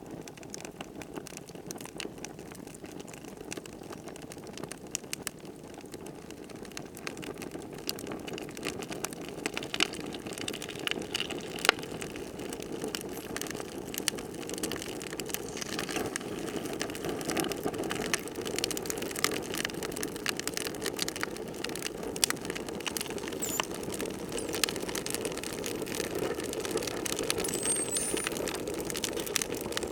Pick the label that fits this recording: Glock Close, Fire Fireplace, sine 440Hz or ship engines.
Fire Fireplace